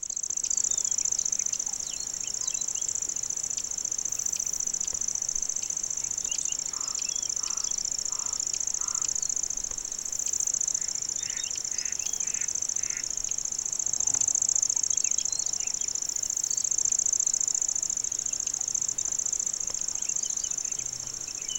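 L lanceolata song, background L calliope song Ph fuscatus alarm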